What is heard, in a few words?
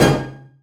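hit minor, break beats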